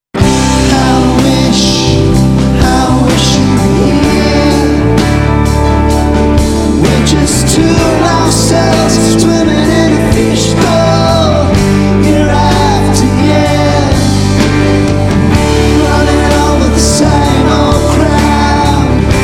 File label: Rock Band; Las Vegas